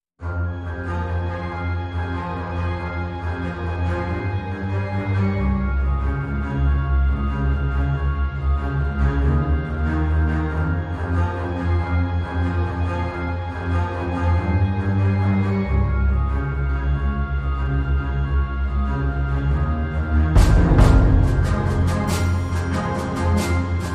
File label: No Hang Drum No Staccato Strings Mix